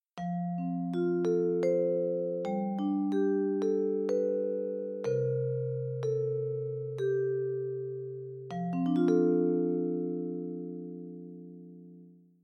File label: Vibraphone